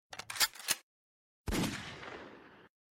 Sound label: gun